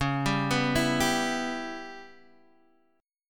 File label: Db Minor 7th Flat 5th, Guitar, Open D